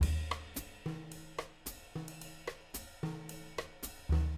110 LATIN, Living Drums; Sampleheads; Peter Erskine; JZ 110 LATIN

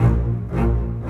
Rock Pop Bass Cello, Orchestral

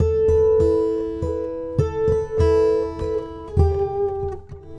Red Trees Gtr, Guitar Only; Sonic Foundry (Sony Creative Software); Friendly Fire

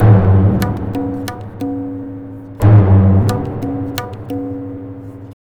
tabla, 90 bpm; Zero-G; Pure Tabla

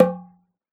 oxaca, percussion; ILIO

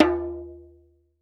Ritual Drums, Drums Percussion; E-MU Producer Series Vol. 7 – Old World Instruments

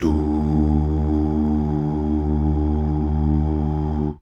DUUH

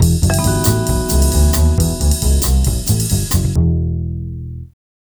TV Suspense Full E, Lounge 135; Zero-G Kocktail Kollection; Construction Kitz (Lounge); TV Suspense Mixes 135

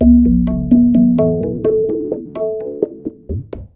TABLAS; Zero-G; Chemical Beats

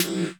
3D S808, 3 D AMBIENCE

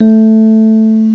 ELEK KEYB; CMI Sound Library Rev 1.3

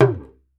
C, talking drum; Best Service ProSamples vol.55 - Retro Sampler; AKAI

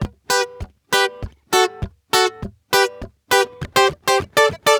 GUITAR SAMBA

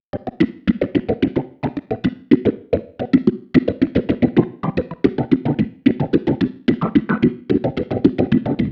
Bubblin, Organ; Sonic Foundry (Sony Creative Software); Joe Vitale Organ Donor

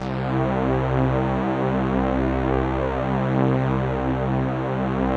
KT76 SUPER, Synthesizers

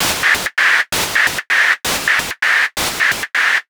Gated Seq Noise, Gate Filtered 130BPM

